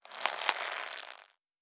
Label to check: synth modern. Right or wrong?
Wrong